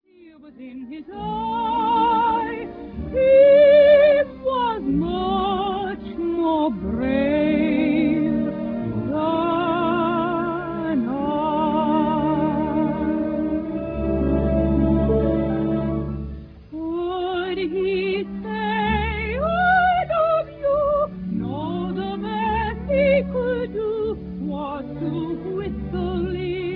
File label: Whistling Boy